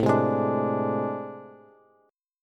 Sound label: A Minor Major 11th A, Bass, Bass Standard; AmM11 strummed; 17,15,18,19